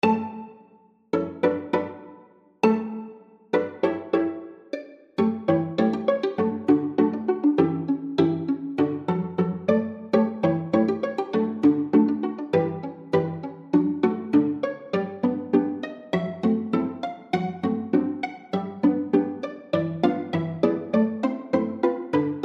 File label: pizzicato polka, flexible mixed ensemble 4 players